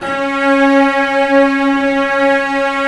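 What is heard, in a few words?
cello C#1; Digital Sound Factory Virtuoso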